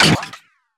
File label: bone; HeavenStudioPlus; dogNinja